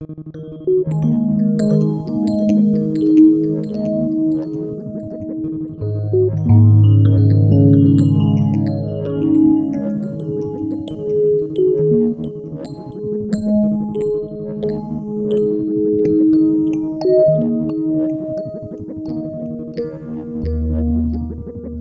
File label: Melody, Hip-Hop